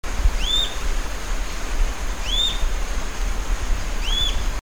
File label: Sittasomus griseicapillus monotone